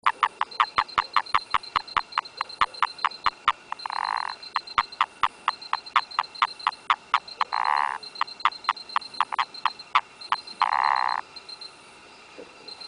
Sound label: Rhinella ornata